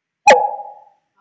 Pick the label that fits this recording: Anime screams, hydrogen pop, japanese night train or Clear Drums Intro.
hydrogen pop